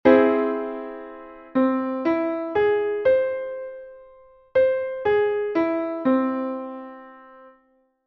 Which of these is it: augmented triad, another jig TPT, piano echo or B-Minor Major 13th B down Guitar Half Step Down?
augmented triad